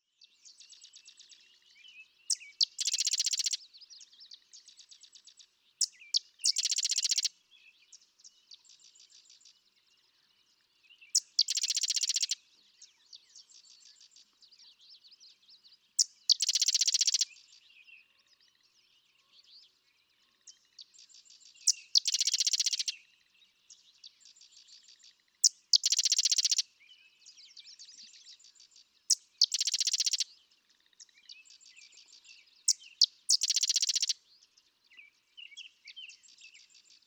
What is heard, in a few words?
Sedge Wren